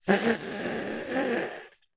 human person blow nose

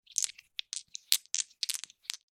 foley 2 dice movement in hand

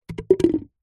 sport cone plastic set down drop concrete ground; zapsplat

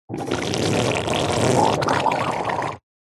cartoon slime hard heavy bubbles squelch; zapsplat

